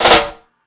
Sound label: FX Sounds, Drum Machines